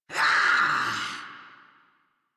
Scream; Warframe; Grineer Catbrow Handler

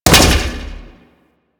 Grenade Bounce; Warframe; Weapons; Corpus

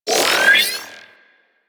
Ship Data Scan Start; Ambience; Props; Warframe; Corpus Ship Remaster

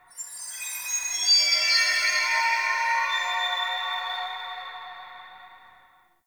Root Cave Magical Chimes Two D; Ambience; One Offs; Neathuns Root Cave

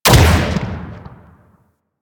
Spear Shot Hit, Weapons; Warframe; Tenno; Priest Spear

